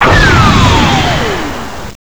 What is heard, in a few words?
repulse; shmod; bansho